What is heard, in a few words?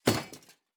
Armour UI; Fantasy Sounds Bundle